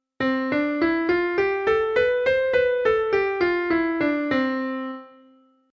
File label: C Major